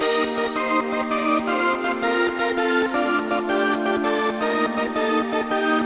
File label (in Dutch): elektrisch orgelgeluid